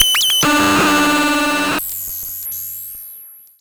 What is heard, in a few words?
Cwejman S1 Squeaky Marble Cutter